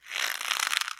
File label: rope